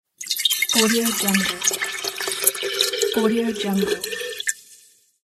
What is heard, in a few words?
pouring into glass